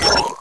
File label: gurp; openffa; terminator